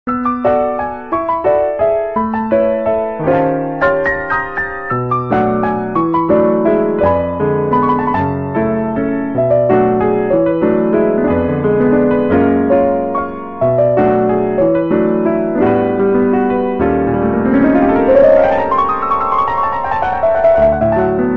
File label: nokia jazz tune, ringtones